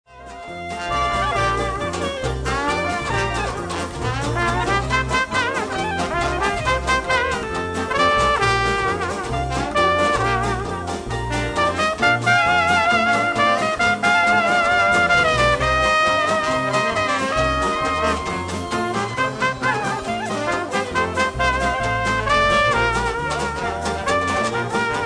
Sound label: dixieband